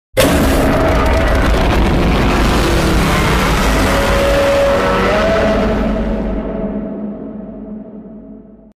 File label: Roar; Godzilla Minus One